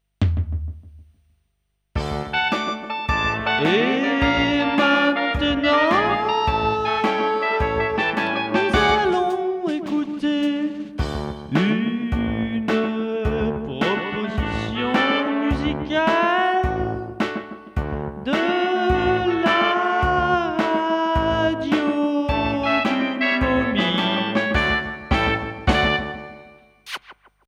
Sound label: jingle; MOMI